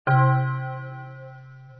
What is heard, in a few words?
giant bell